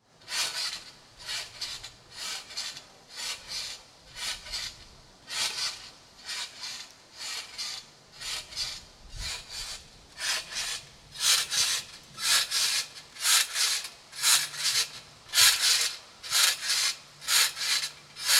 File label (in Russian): batut